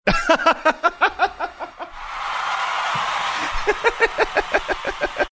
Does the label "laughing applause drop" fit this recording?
Yes